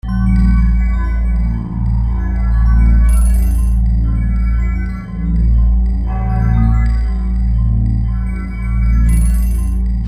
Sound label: winter snow storm; ICAD2003